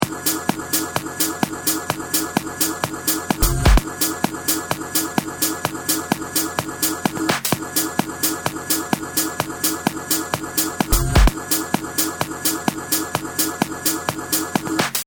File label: start round, music